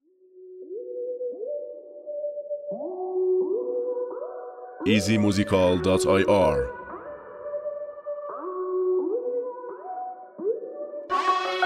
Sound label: Beat